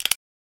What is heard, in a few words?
click effect